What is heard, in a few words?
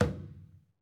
Skin Drums